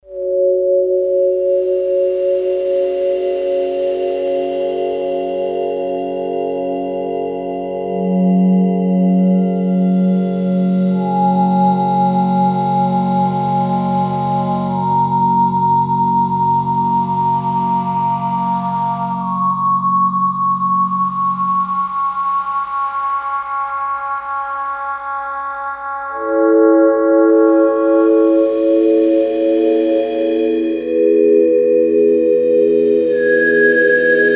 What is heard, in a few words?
Korg DS-8; Factory Patches; FallnAngel